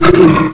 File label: pain; wanted